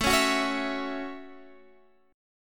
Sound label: F sharp Minor 7th A